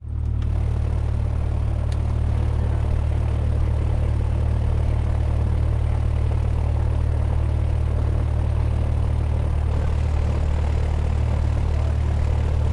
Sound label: airplane small propeller cessna172 taxi window open; a-format; sonniss gdc